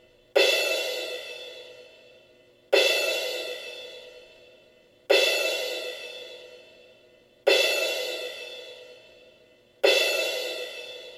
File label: cymbals